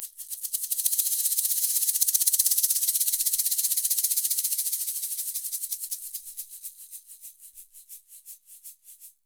FAST, PERC, Percussionworks; Sampleheads; NYC Percussionworks; 90 s Sample CDs

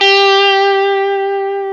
GTR Tube, Dirty; Club-50 - Foundations Roland

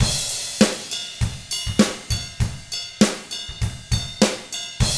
ROCK, Drums; ILIO; Double Platinum Drums 1

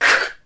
NOISE, CLASSIC VOX; Best Service; Hallelujah

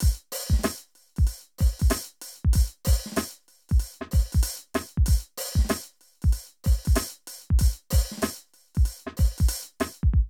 Drum loops, Hip-hop

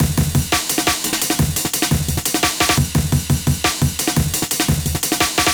amen; Amen Breaks

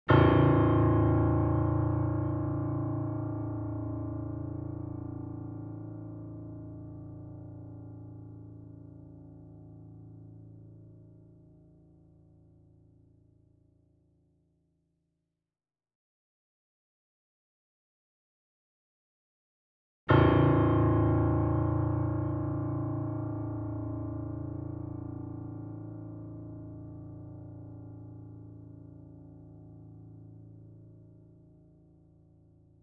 A0 Grand piano Sustain; Sound Sampling Collection VOL-3; Grand Piano 88

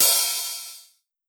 OHH, Metallic Percussion; SN-R8-11 Metallic Percussion

